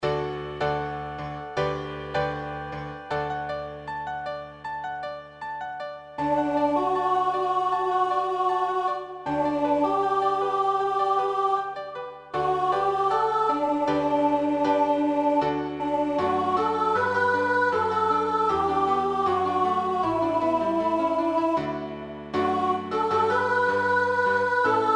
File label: one voice midi